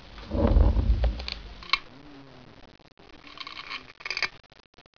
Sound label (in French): escargot